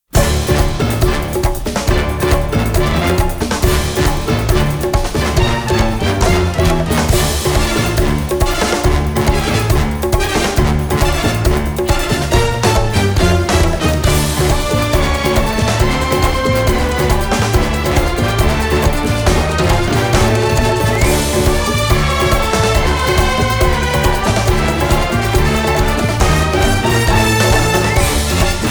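The Way the Cookie Crumbles (No Choir), trailer music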